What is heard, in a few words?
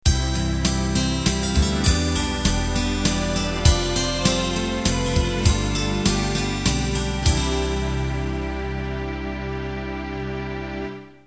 Waltz End